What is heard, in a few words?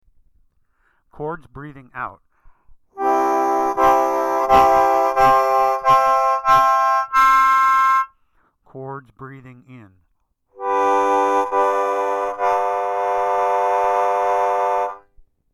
Chords Out And In